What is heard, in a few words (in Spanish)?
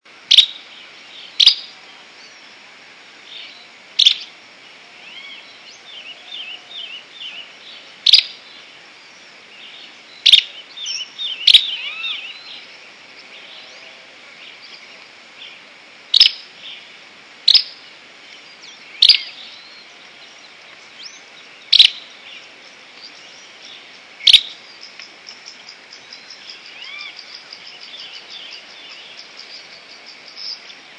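Barullero, Euscarthmus meloryphus, Tawny-crowned Pygmy-tyrant; cantos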